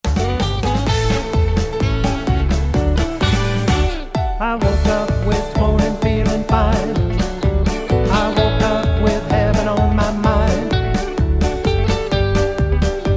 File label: harmony